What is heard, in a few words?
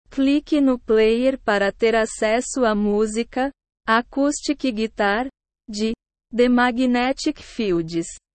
acoustic guitar